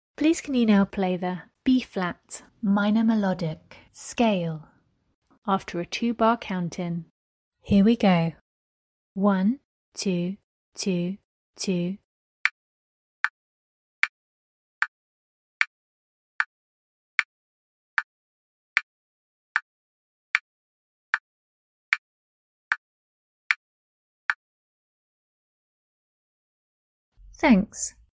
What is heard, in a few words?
B flat minor melodic